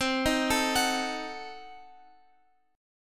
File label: C Diminished 7th C, Guitar Open F; Cdim7 arpeggiated